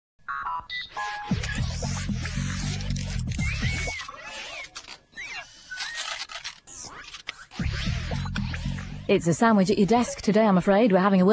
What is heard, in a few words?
jingle; bbc